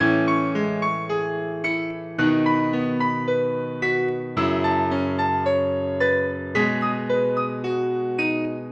Beautiful Dance Keys

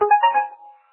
Keypad

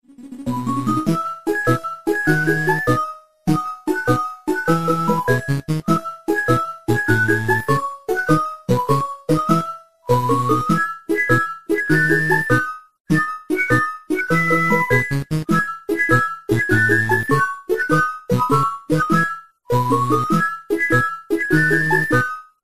80s Simple Best Tone

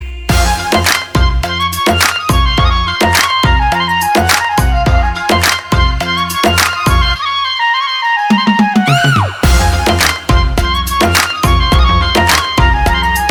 instrumental ringtone

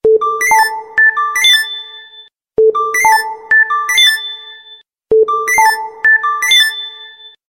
cool SMS tones

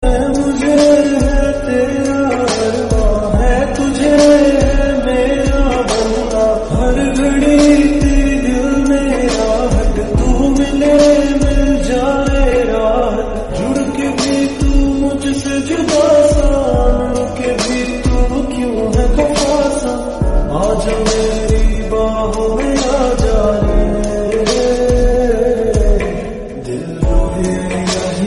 Slowed Reverb Hindi; Raaz 2

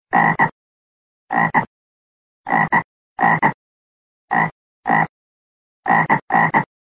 Frog Sound

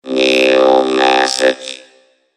Message Robot

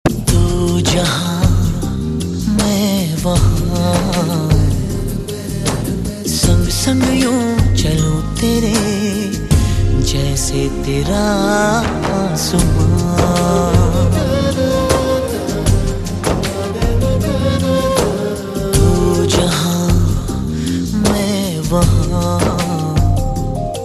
Nice Tone; Hindi

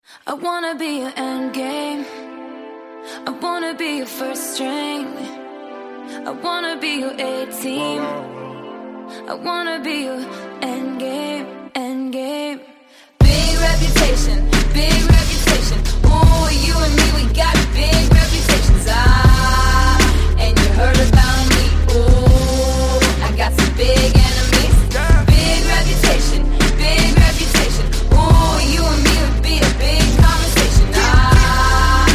pop

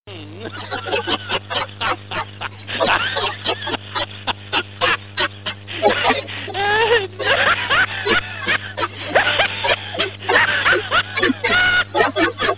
Mad Laugh

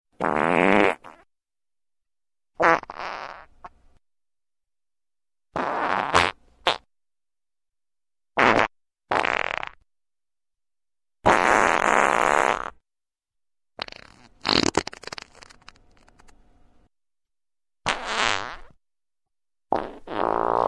Fart Noises

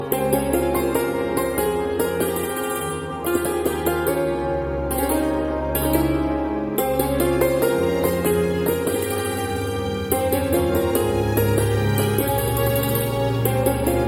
Santoor

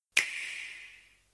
Nintendo Switch Snap

